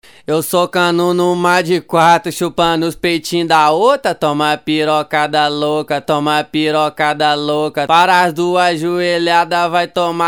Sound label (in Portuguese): acapella